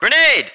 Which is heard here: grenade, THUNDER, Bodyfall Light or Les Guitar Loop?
grenade